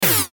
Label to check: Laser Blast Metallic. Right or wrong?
Right